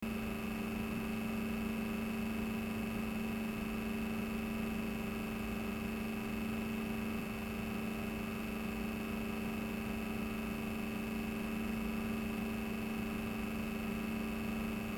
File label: sapphire 9600xt ati 10.5v